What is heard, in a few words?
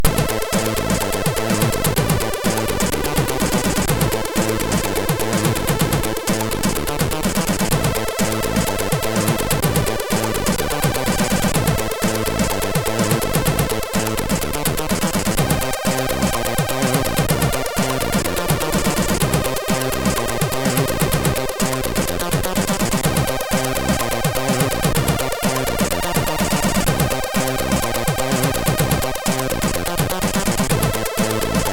Disco, MOS6581R3; hvsc